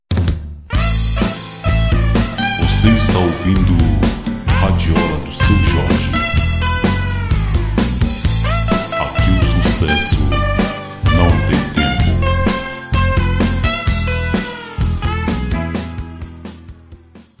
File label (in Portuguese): vinheta